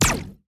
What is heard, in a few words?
Rapid Laser Fire